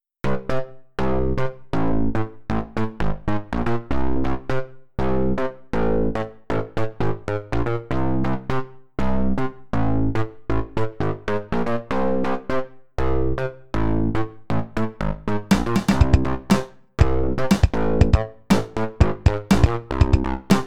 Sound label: lofi